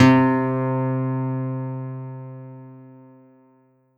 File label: Yamaha EX5 Clear Nylon C3